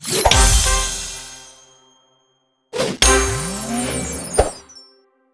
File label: match results badge; ringtone; Mini Militia